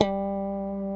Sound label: G 3 FUNK, STRAT, Guitars; Spectrasonics; Hans Zimmer Guitars VOL-1